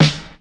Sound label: Clean Snare, Ensoniq ASR-X Pro